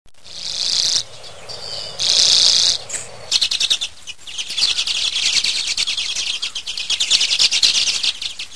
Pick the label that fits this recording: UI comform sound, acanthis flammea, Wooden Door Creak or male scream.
acanthis flammea